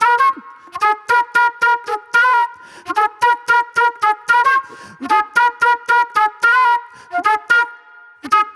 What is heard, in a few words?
Raw Funk Flute; Sonic Foundry (Sony Creative Software); Manhattan Flutes